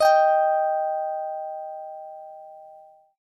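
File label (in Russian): гитарные мелодии струна G с гармонайзером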